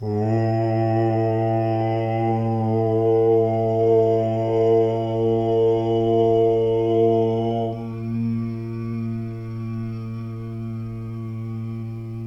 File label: mantra om